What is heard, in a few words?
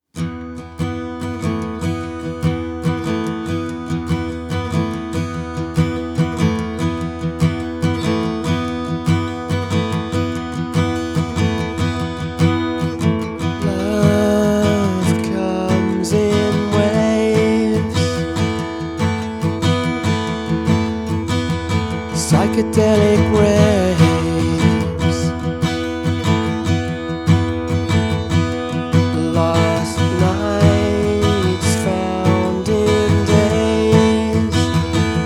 acoustic version